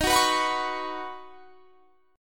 Eb Minor 7th Sharp 5th Eb; Ebm7#5 strummed

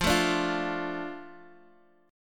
F 6th, Guitar DADGAD; x,x,3,2,5,3

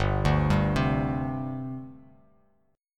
G Suspended 4th G down, Gsus4 chord; arpeggiated; JGuitar

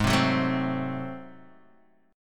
Ab 7th Flat 9th Guitar Half Step Down, chord

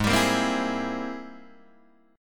Ab Augmented Major 9th Ab Guitar Open D

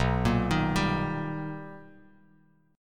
B Augmented Major 7th B Bass Standard, chord; arpeggiated; JGuitar

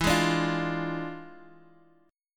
E 7th Flat 9th E down Guitar Half Step Down; chord

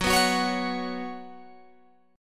F sharp Suspended 4th, Guitar, Open G

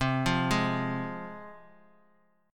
C Suspended 4th Sharp 5th C Guitar Standard; arpeggiated; 8,8,6,x,x,x; JGuitar

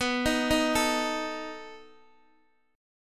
B 7th B down Guitar DADGAD

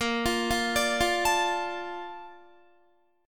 A sharp Major 7th, Guitar; arpeggiated

